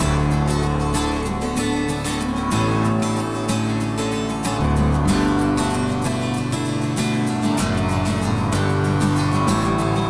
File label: Breakdown